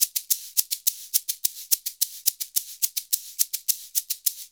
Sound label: SHK, Shaker; Univers Sons; Basicussions